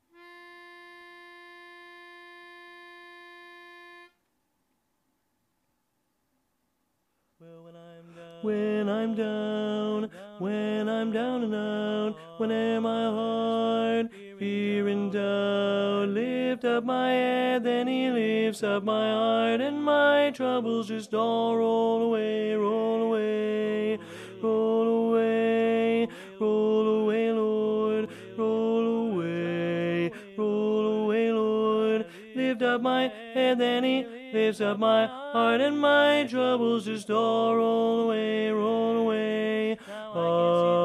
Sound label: BARITONE; Pennsylvania Groups